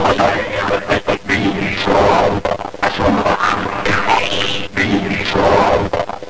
intro transmission

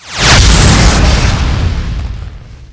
displacer teleport blast, weapons

